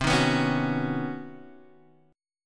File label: B Major Add 9th C, chord; Badd9/C; strummed; JGuitar